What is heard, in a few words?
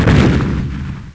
EXPLO